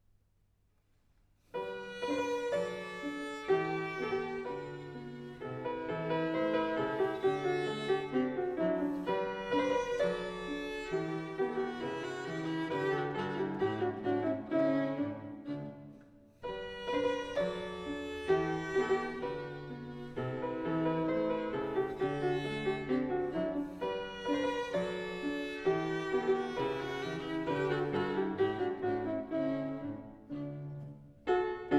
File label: Sonata for violin & piano in G major, Andantino cantabile; Mozart